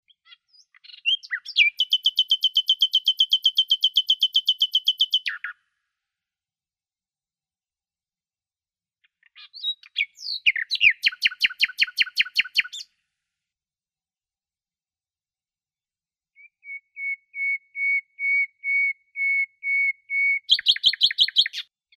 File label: Luscinia megarhynchos; BIOAC AVES